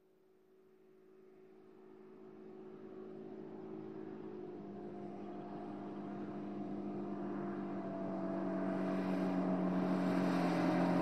Bus Ext By L to R W Stop

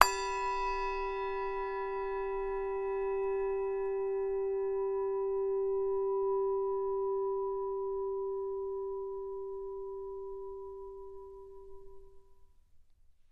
Bowl Lrg Strike, singing bowls

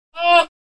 Beaver Call. Single Short Call. Close Perspective

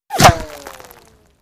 Bullet Impacts With Whir In, Impact And Debris; stone

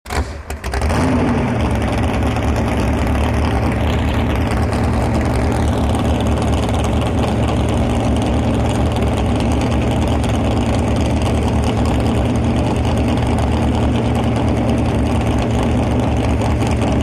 Drag Boat Start revs

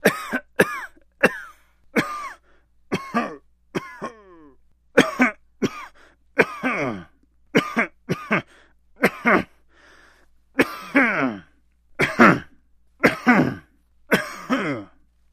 Coughs Various

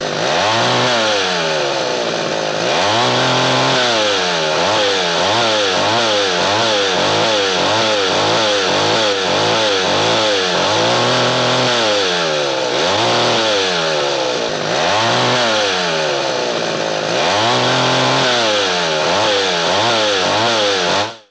Chainsaw Rev Big Shr, chainsaws

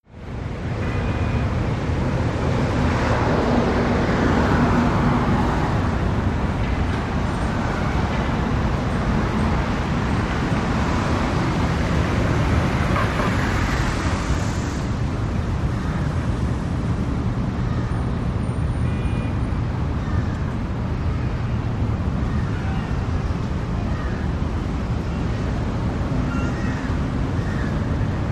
Downtown Traffic Big, big city

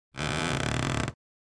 Creak

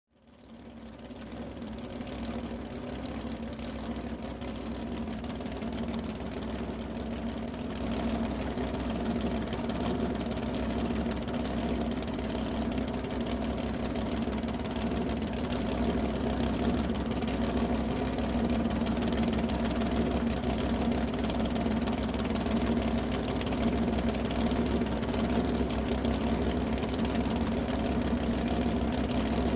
Drag Boat Idle off

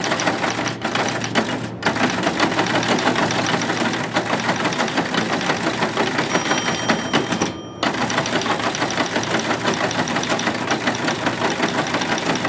Teletype Old Bell; typewriters

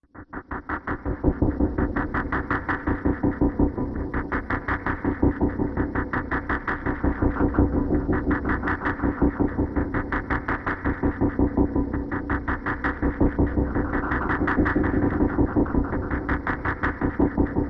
Magnetic Pump Machine; energy beams

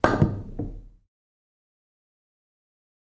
Billiard Cue Stick Hi

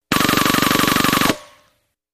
Fast Silenced Machin, silenced

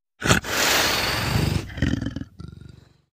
Leopard Snarl Raspy; big cats

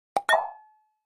Pop Up Hollow Pop; pop up element

